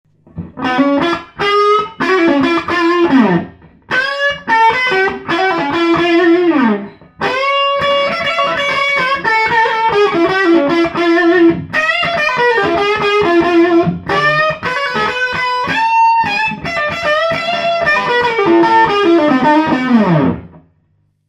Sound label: 1965 jmi ac30 vs les paul standard